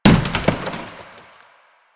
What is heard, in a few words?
janitor cart impact